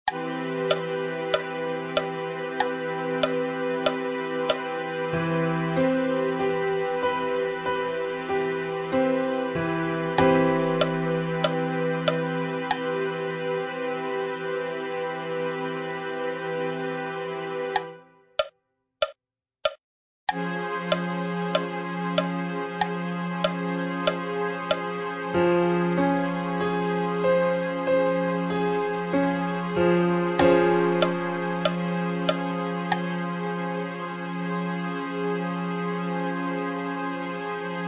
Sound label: maj7 inv